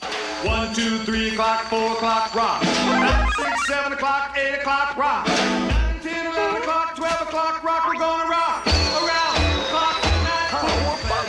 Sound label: Short music sample of a cover song